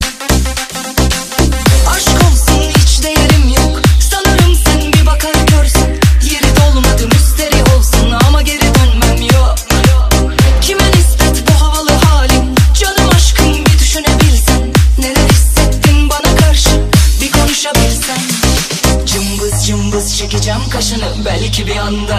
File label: intrument turkish ringtone